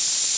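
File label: percussion; ZERO-G; Datafile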